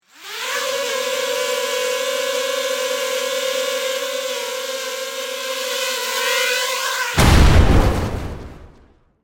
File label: Military drone flight and explosion; style 1 long; misc explosions; gfxsounds